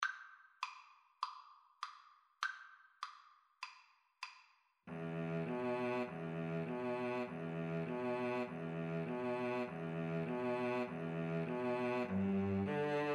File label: mountain king, Violin Cello Duet; greig